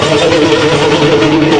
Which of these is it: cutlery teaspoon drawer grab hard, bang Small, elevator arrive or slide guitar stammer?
slide guitar stammer